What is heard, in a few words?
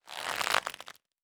Rope; Survival Game Sounds - Lite Edition